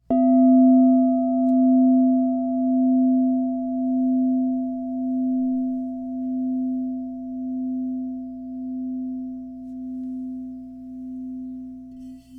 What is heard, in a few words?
Crystal Tones Singing Bowls